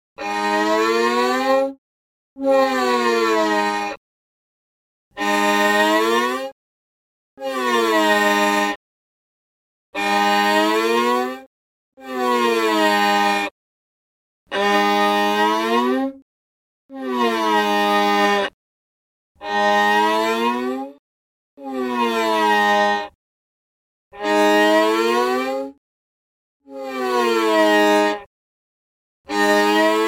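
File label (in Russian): звук скольжения туда сюда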